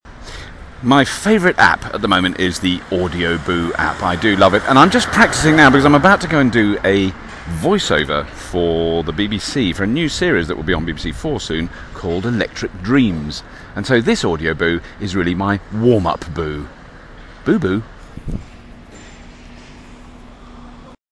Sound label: warm up